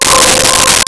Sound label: Bowed Glass C5; Nintendo; July 2020 Gigaleak